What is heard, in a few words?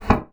bassoon slap; Xsample VOL-6; C BASSOON